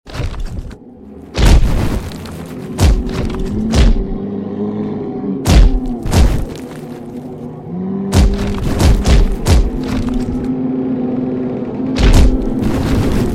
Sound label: Lonely Manor entrance Hall